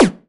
BD, BASS DRUMS; EdgeSounds

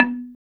KULINTANG C4; Spectrasonics; Heart of Asia; GAMELAN SET

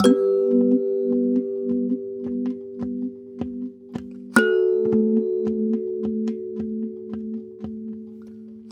Kalimba, African

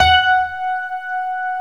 GRAND PF F#4, AKAI S-Series; AKAI S-Series CD-ROM Sound Library VOL-7; D.GRAND PF

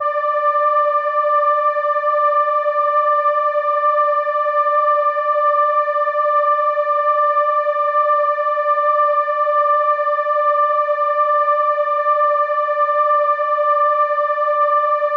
Horn D6, ARP Solina

